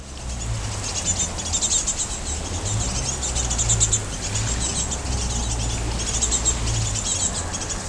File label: Parus major calls juv